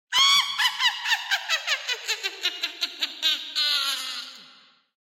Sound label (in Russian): smeh vedmy